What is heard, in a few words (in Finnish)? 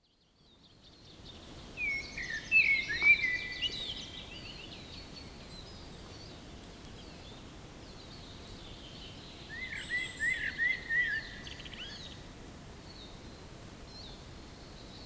mustarastas; linnut